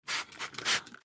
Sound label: Spray from a plastic cleaning bottle